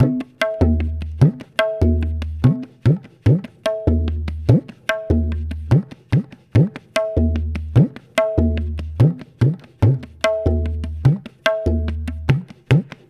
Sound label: Tabla